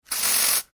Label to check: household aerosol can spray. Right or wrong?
Right